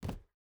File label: footstep barefoot wood jump land; Rust